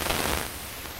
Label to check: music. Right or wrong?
Wrong